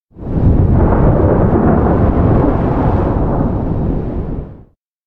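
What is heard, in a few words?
electronic black wind closer; anemoi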